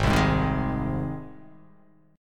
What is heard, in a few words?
Ab Major Ab down; strummed; JGuitar